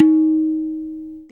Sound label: TOON, TABLAS; AKAI S6000 CD-ROM - Volume 1; PERCUSSION